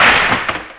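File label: splinter; outlaws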